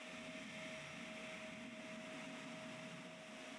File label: dryer recording